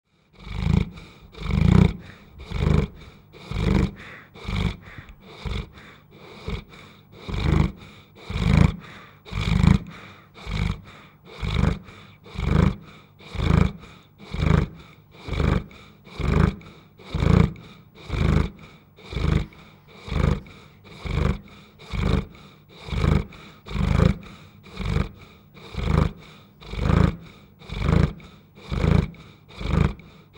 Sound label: Dog Snoring, Huge Newfoundland dog snoring; could be monster; Animals